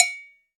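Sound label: CHA CHA BELL, Latin Hand Perc; E-MU Producer Series Vol. 2; More Studio Essentials; Composer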